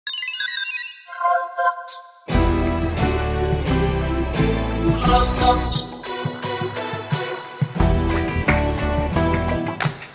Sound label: Karaoke